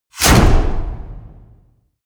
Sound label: wham, whooshes